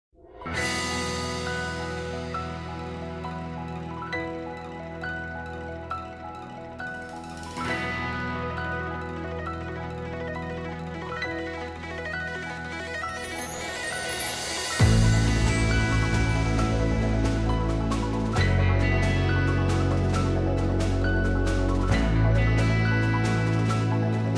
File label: inst